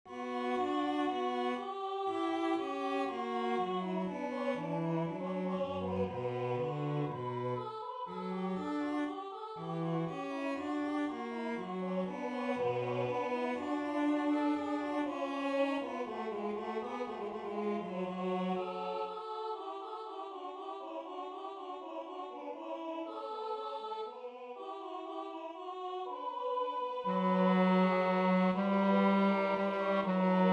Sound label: Bass